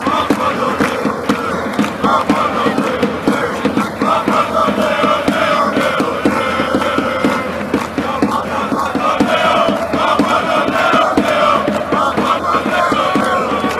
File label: ole ole loppolon, fanchants